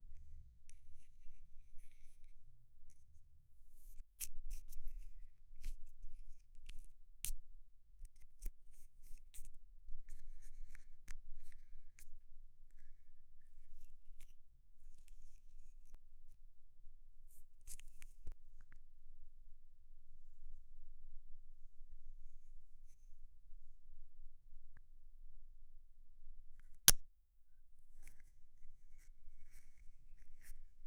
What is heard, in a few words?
applying makeup to the fa; personal care